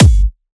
kick drum one shot g key, kick drum sample tuned to the G note